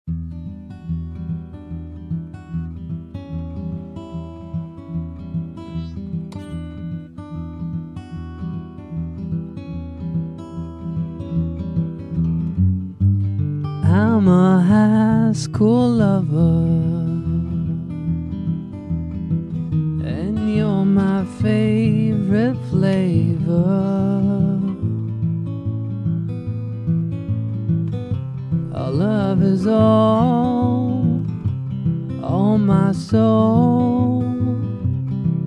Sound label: Acoustic